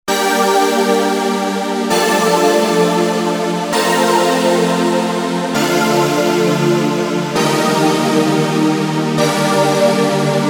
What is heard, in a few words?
synth brillant, korg n364